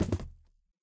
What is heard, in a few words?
phys cube, physics